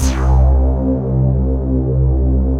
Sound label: PAN TONES; Pro-Rec - Synthsonic; TRINITY